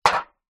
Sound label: drink can small amount liquid inside down on table